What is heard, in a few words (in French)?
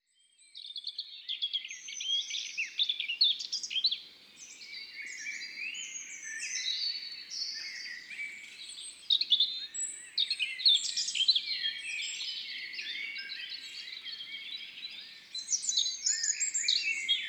Merle noir rouge gorge et troglodyte Saint Laurent les Bains; Ambiances Sonores d'Ardeche